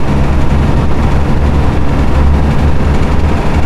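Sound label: bg machine; Dystopia; cybernetic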